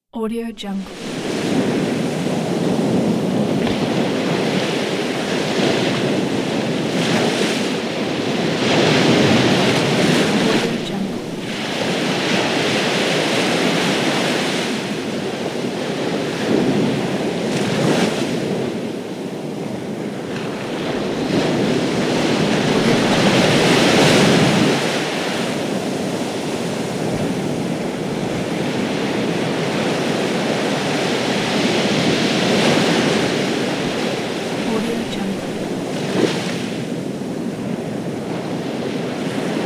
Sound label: heavy ocean waves